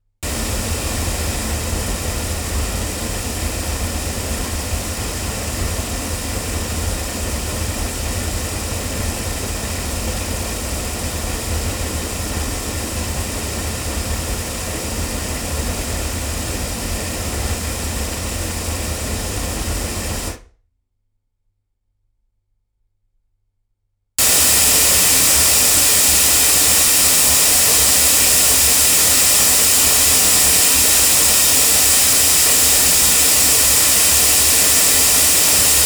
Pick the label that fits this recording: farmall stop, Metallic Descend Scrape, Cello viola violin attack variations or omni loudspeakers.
omni loudspeakers